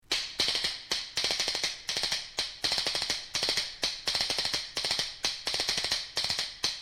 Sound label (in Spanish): palillos